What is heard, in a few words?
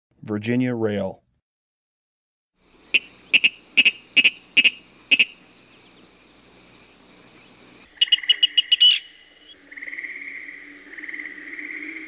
Virginia Rail, Birds of the Chicago Region